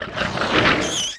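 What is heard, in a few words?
Skeleton Warrior die; Seer; GamigoNA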